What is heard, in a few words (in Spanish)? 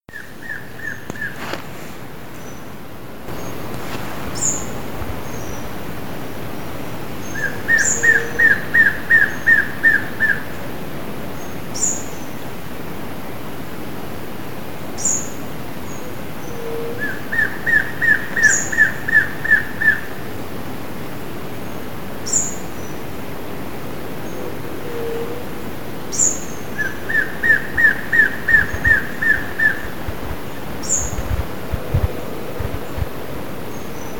B nigropectus, Batará Pecho Negro (Biatas nigropectus)